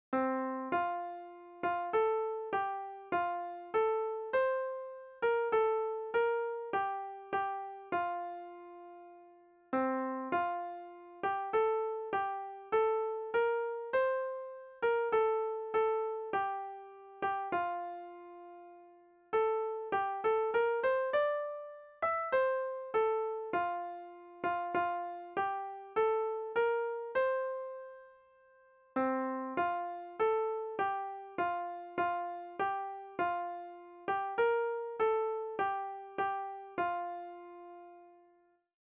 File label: hymn melody